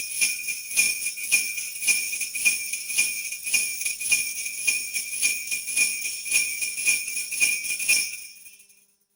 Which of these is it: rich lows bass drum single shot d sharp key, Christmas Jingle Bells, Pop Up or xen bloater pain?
Christmas Jingle Bells